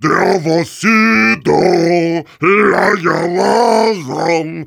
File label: Heavy singing